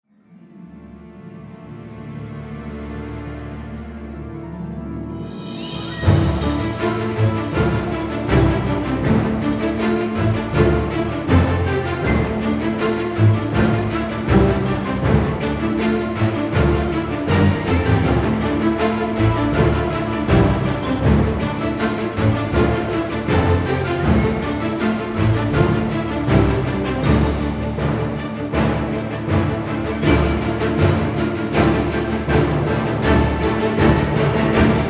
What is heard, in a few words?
egypt cinematic mix